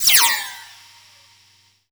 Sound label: FLY-BY; Spectrasonic Distorted Reality 1